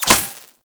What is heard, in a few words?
Laser Impact Metal